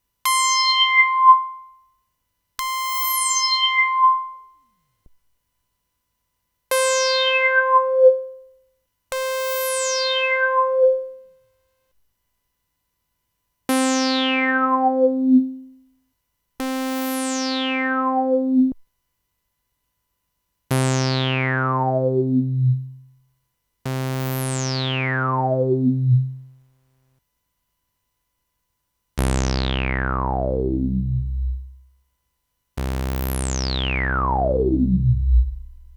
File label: solaris vs andromeda moog filter env 3 sec